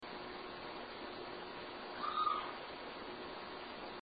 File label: main floor scream; paranormal; elsings 2nd hand shop